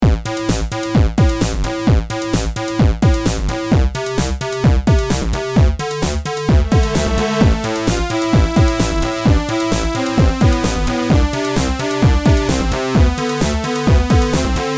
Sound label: bass drums melody its just electro, boss battle